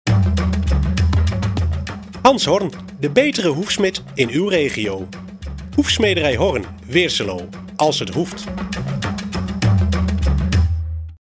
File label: Horn